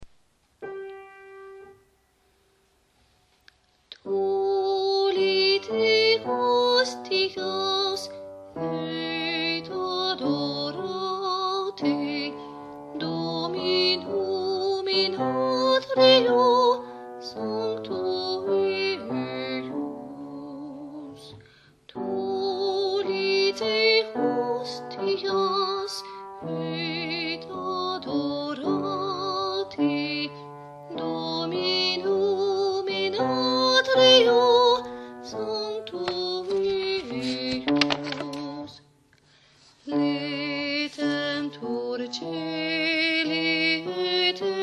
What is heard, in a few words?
tollite hostias, basses